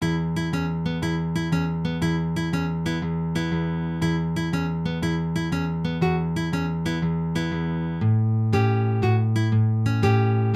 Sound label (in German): Blues in E Dur, picking